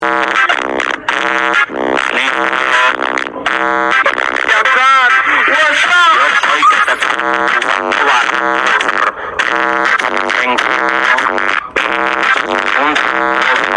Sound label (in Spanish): regaetton champagne saturado